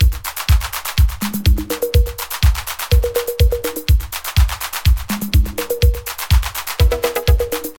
124 bpm 00s disco drum loop c sharp key, kick tuned to the C# note